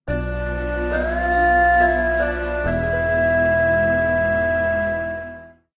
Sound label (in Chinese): 失败惨痛悲伤的手游斗地主音效